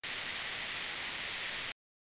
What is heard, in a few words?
radio noise